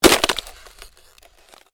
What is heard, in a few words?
Ice: short crack of ice under pressure